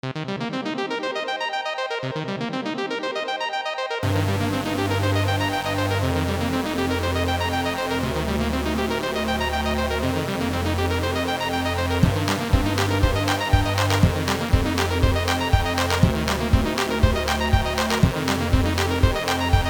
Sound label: Lofi Dreams